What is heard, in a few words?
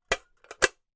household safe small desktop metal close then lock